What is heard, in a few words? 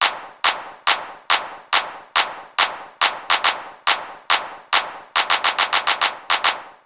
Claps, 140 BPM; Rap; Vengeance Samples; Vocal-Hook Kits; Song Kit